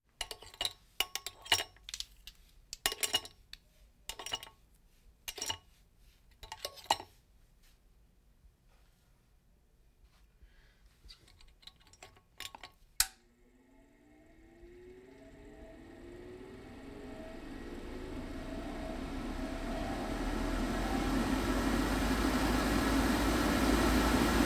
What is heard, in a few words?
Hospital effects